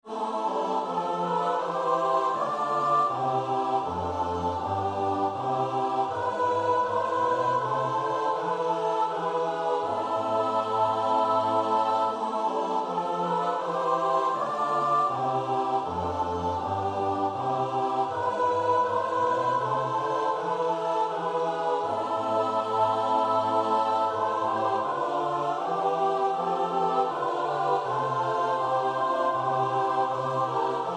S-A-T-B; Bach (Johan Sebastiaan); BWV 244; O hoofd vol bloed en wonden

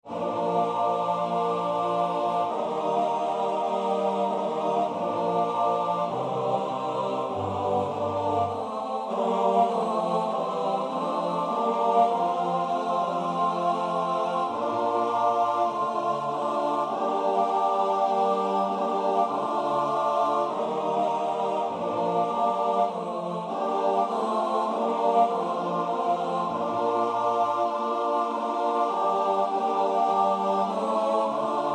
S A T B; Handl (Jacob); In nomine Jesu

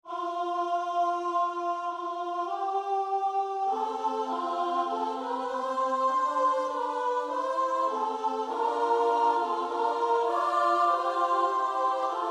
S-A-T-B; Hassler (Hans Leo); Agnus Dei

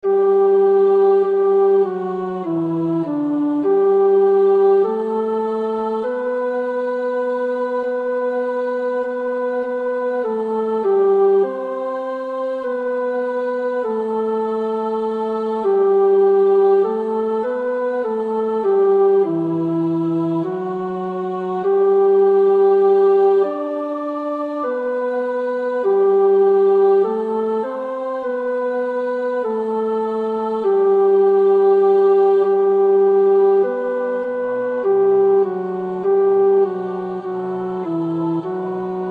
tenor; Koelewijn (Bert); Psalm 134

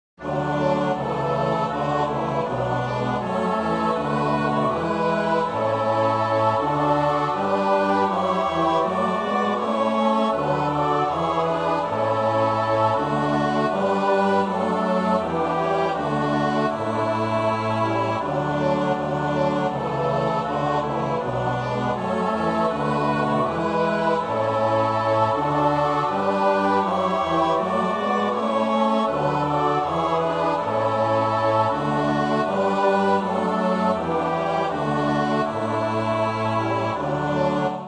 S-A-T-B; Bach (Johan Sebastiaan); BWV 036; Zwingt die Saiten in Cythara

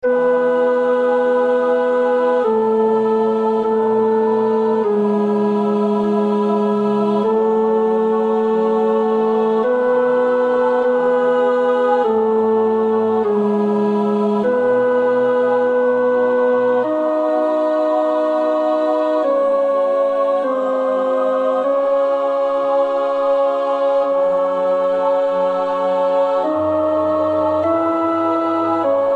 tenor; Parry (Hubert H.); Psalm 84

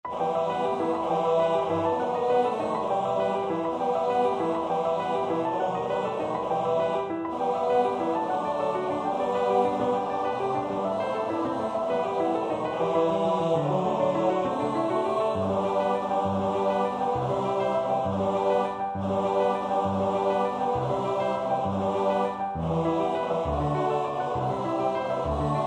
We Three Kings of Orient Are (T-B); Christmas Carols

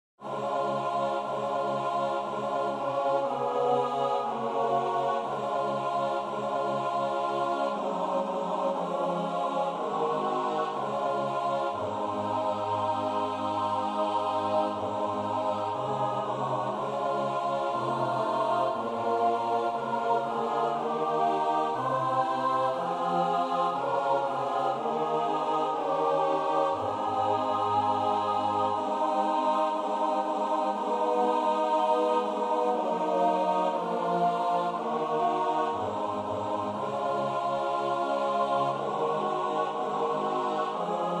S A T B; The Lord's Prayer

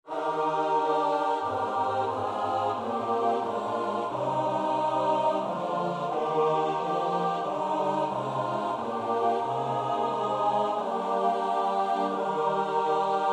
S-A-T-B; Nieuw Liedboek; NLB 974-Maak ons uw liefde, God, tot opmaat

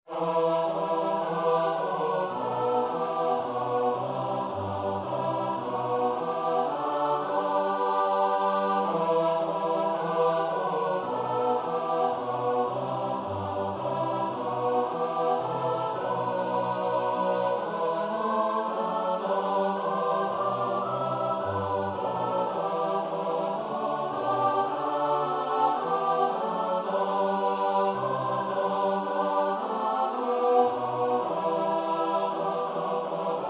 S A T B; Christmas Carols; God rest you merry, gentlemen

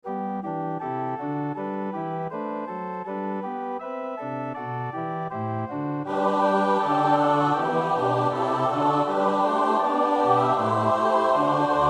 S-A-T-B; Händel (Georg Friedrich); Lord I trust thee

